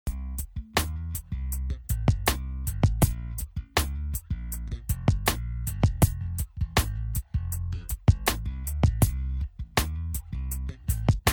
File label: Multi Comp